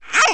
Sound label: Mantrap DMG; Seer; GamigoNA